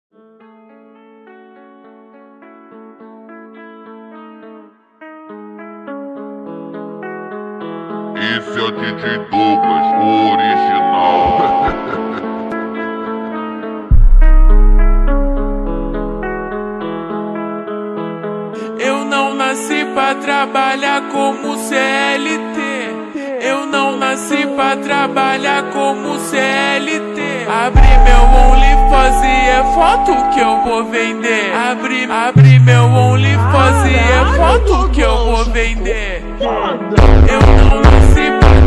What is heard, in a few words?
Slowed version